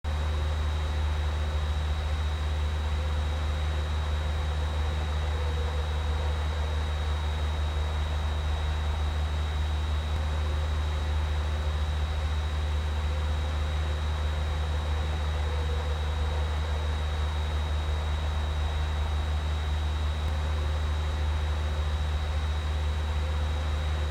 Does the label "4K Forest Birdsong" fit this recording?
No